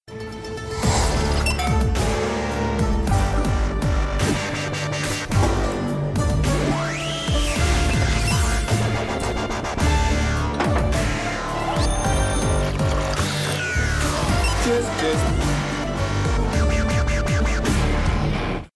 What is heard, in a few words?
theme dubstep; music to picture